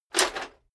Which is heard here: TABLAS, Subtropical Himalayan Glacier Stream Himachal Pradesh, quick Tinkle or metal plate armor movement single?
metal plate armor movement single